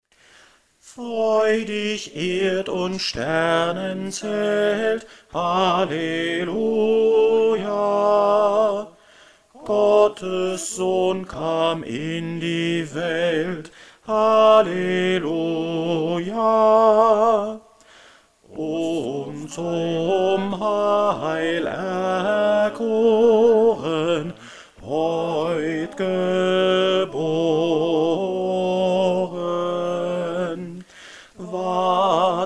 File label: bass